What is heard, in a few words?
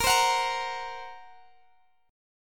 A sharp Suspended 2nd Sharp 5th A sharp down Guitar Standard; strummed; capo=2